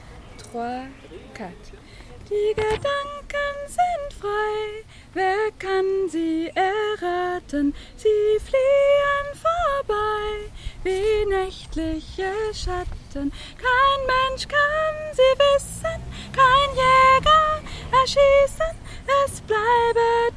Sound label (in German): die gedanken sind frei soprane sol